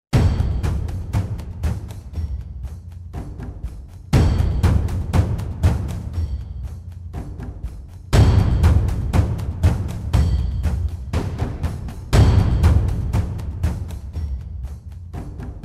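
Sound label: Basic Accents 8th feel, drums; Rise of the Machines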